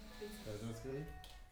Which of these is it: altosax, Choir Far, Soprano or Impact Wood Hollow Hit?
Choir Far